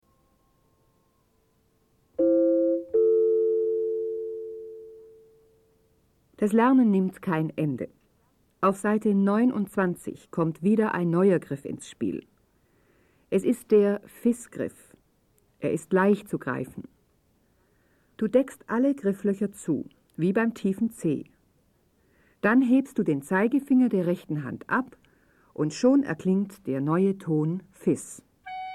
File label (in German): Spiel Blockfloete